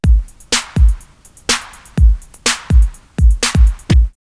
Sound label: Beatmaster 124, drumloops, Techno&House; X-Static Goldmine